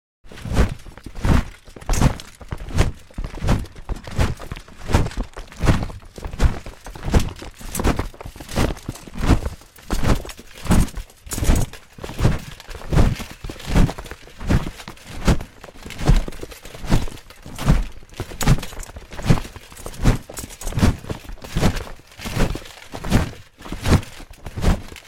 soldati marshiruyut

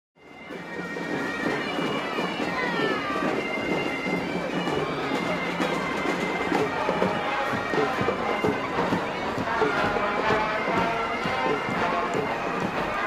tolpa na prazdnike volinka barabani tromboni zvuki sredi

